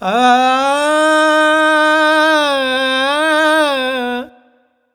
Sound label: Vox, Vocals Male, World Pop